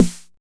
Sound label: 909 SD; TR808+909